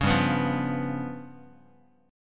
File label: G 7th Flat 5th, Guitar; G7b5 strummed